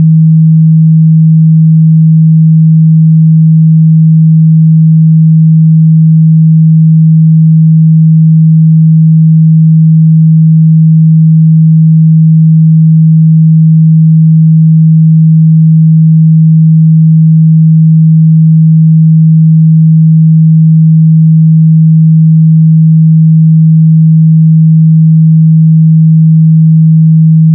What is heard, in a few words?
tone 44k